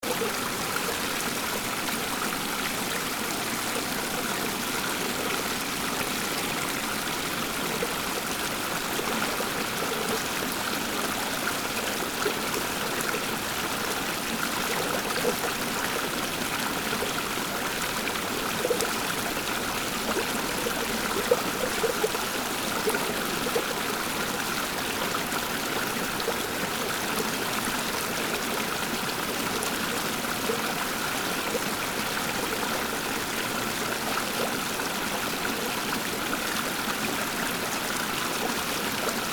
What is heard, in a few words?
water creek sound